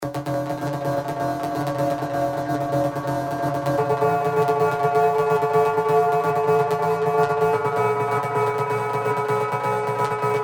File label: Raw Substrate, pads; Spire; revealed spire aporia pads